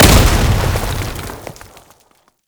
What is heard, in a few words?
Orbital Strike Explosion; Enemies; Abilities; Warframe; Tau; Dax Heavy